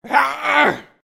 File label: wound